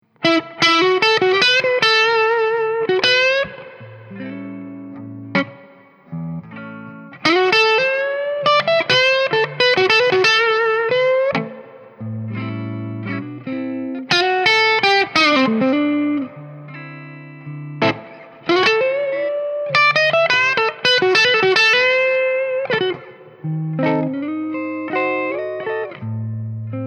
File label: ES 335 neck